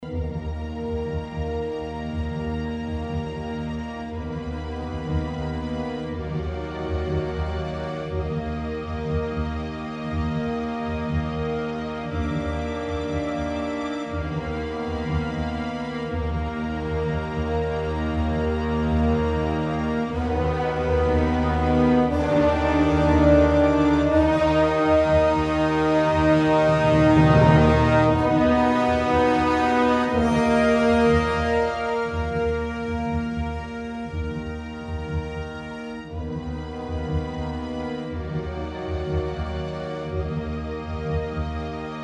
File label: Gameplay Action Loop 24 Bars; Agent A A Puzzle in Disguise; Windows